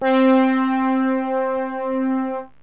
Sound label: JUPITER, SYNTH